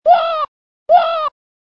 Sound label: Whaaa Whaa